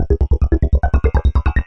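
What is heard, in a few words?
evil electro babble, 143 bpm; SYNTH AND ELECTRONIC LOOPS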